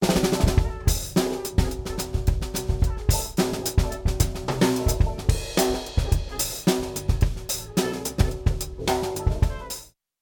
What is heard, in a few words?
DRM MidLimit